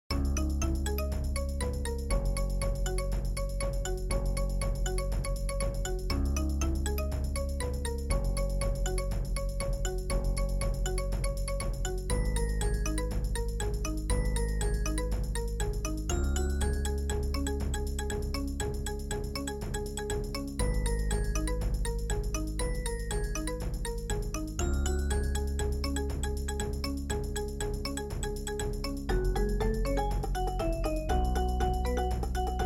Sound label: percussion ensemble